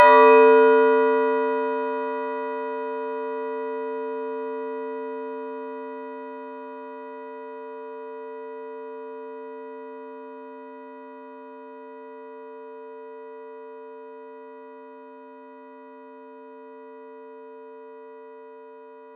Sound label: synth; sound effects and sound reinforcement